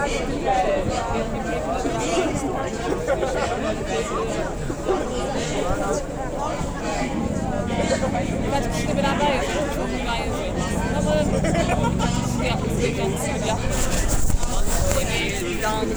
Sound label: foley